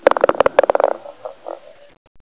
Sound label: RATTLE